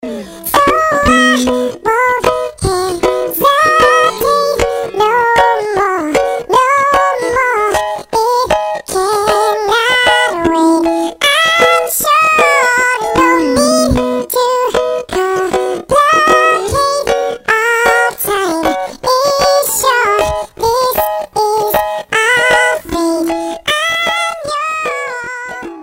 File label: Chipmunks